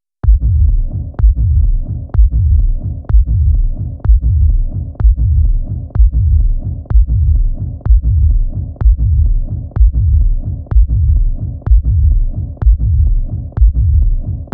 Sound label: bass with